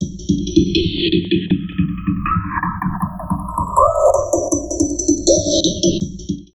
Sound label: VOCOD, Drum Loops Crazy Processed; USB Soundscan vol.07; AKAI